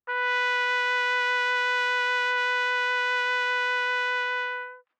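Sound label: Trumpet vibrato ff B4 stereo, Bb Trumpet; MIS Pitches - 2014; Brass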